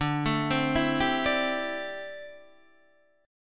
D 9th Suspended 4th D down Guitar Open D

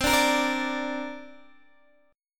C 7th Suspended 2nd, Guitar, DADGAD

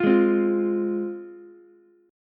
F Minor F down Ukulele; strummed; JGuitar

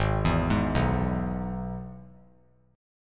Gsharp Minor Sharp 5th; G#m#5 arpeggiated; JGuitar

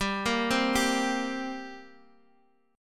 G Minor Double Flat 5th, G, Guitar, Open D

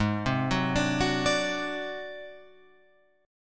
A flat 7th Flat 5th A flat Guitar Open F, chord; Ab7b5 arpeggiated; JGuitar

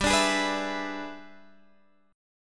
E 7th G, guitar; E7/G strummed; JGuitar

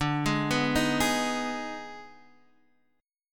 Eb Major 7th Suspended 4th Sharp 5th

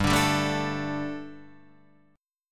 G Suspended 2nd G, Guitar; Gsus2 Chord {3 5 5 2 3 5} strummed

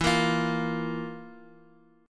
F Major 7th Suspended 4th E

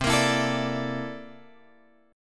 C 13th C; C13 Chord {8 X 8 9 10 10} strummed

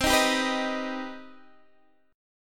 C Minor Major 7th C Guitar Open D

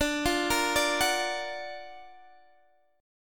F sharp Augmented Major 7th D

